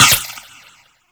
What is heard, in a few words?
ricochet; Warframe; Cowgirl